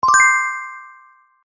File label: win